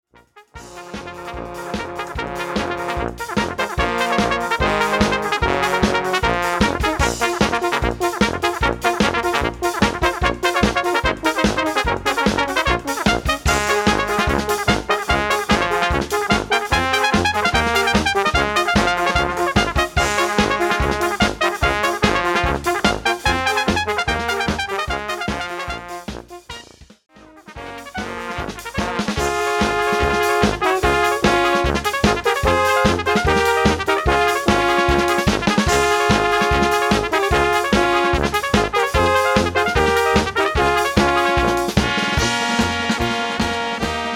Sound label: Brass